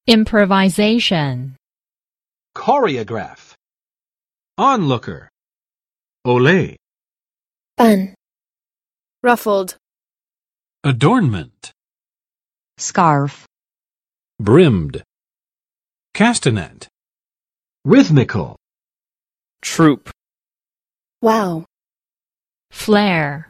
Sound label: improvisation; the fire of flamenco; arts